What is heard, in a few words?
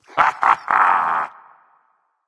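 nonverbal heh heh; smite; voicepack; merlin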